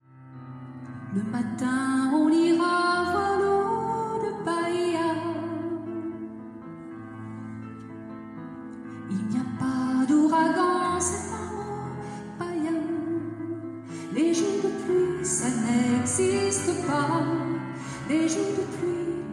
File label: renepiano